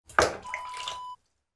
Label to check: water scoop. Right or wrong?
Right